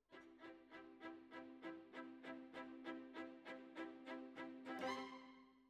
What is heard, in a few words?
Violas Over